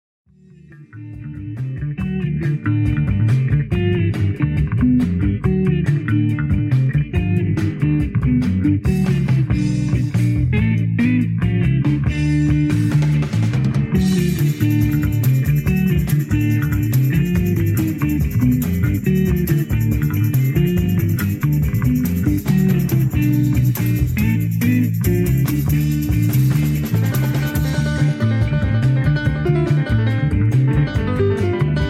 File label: radio orchestra